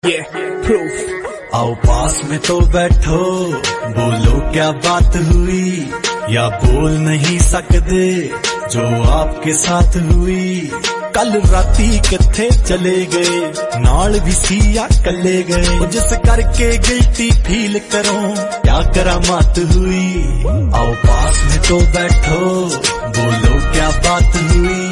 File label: Punjabi Song Ringtone